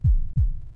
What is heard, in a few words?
Heart Beat